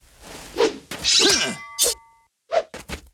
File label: Spear Attack; SFX 3D Heroloin; Puzzle Chronicles